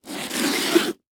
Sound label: Foley Footstep Hard Sole Dress Shoe Scrape On Cement; Foley Footsteps